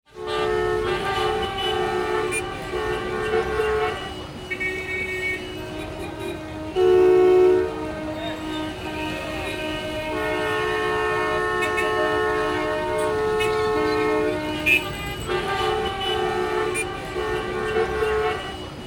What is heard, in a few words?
Town Loop; Yu-Gi-Oh! Master Duel